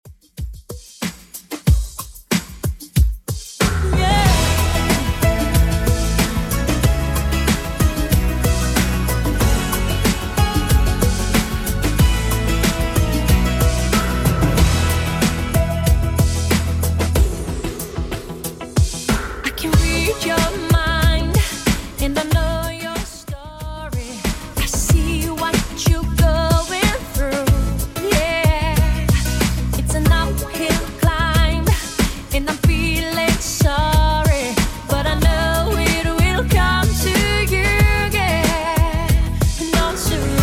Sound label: 90s 73bpm; Redrum